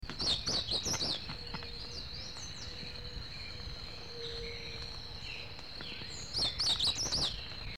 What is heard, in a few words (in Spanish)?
reina enana, Reinamora Enana, Amaurospiza moesta, Blackish-Blue seedeater; Emberizidae; cantos